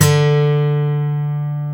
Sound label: GUI Ac. D3, GUIT AC; Best Service Gigapack 2